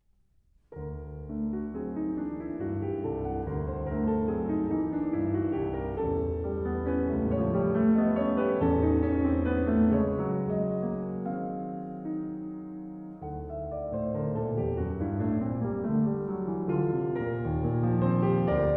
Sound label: Mazurka